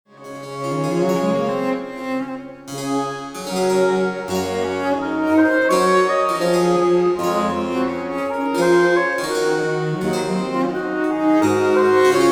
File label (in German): Trio in G Dur Adagio